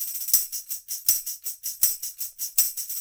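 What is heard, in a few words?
080 tambourine; Zero-G; Monster Beats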